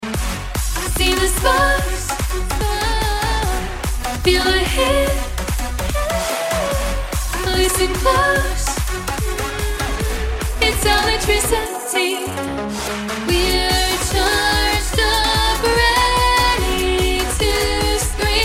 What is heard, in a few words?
Sample, female singers